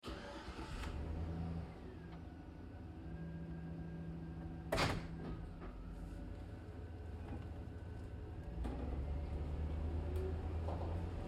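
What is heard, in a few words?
Truck GMC Savana interior Drive Slow; Vehicles; 2010 GMC Savana; Trucks; BigRoomSound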